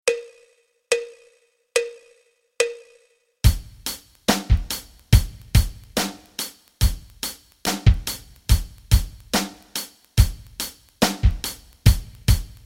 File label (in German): rock rhythmen